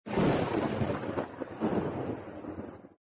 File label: thunder